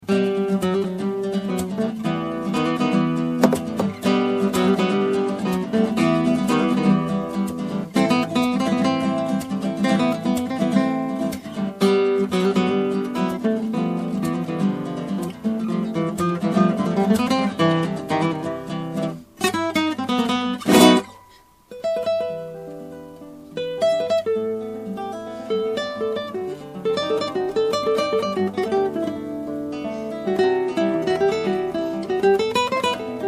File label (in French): duo medley espagnol extrait, guitares